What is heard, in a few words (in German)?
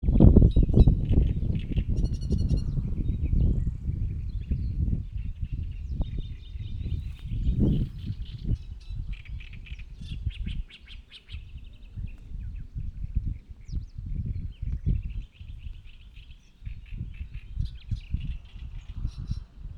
drosselrohrsaenger; ostgrenze von bernhardsthal nach bad deutsch altenburg; alpinfranke